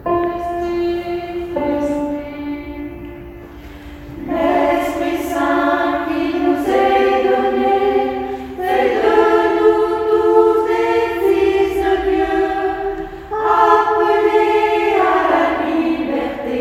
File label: soprano alto; L Esprit Saint qui nous est donne